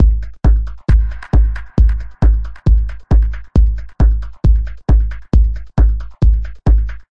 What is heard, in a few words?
135 bpm retro electro hardkicks reverbs